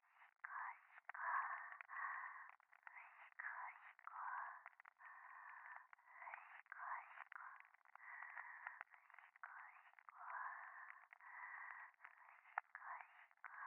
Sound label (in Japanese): しこしこ 暖炉